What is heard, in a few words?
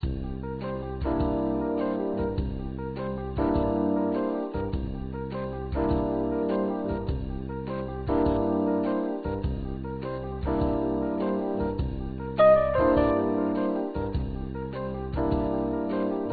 jazz